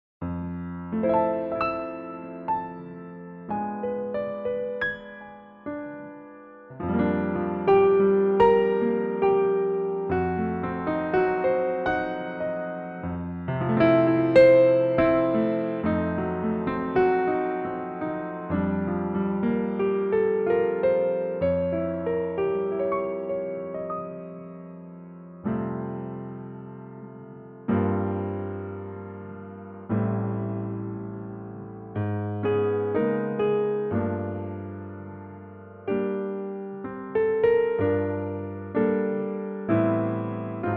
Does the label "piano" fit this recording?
Yes